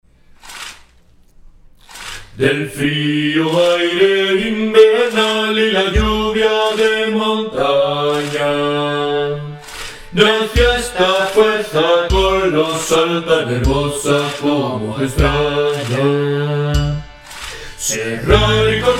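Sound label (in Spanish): Corazón hielo bajos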